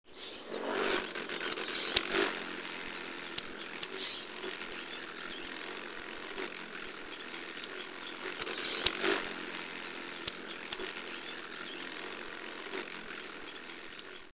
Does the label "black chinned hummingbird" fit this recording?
Yes